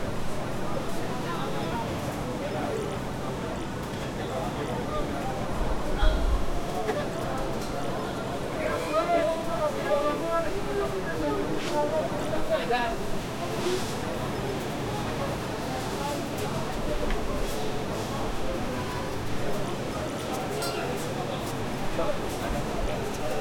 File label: market